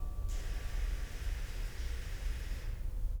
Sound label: foof